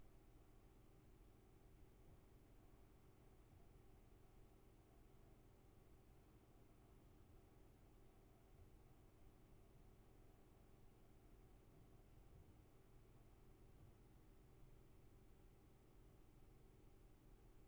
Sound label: room tone